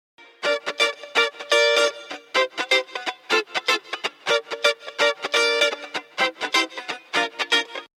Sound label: funk jam sms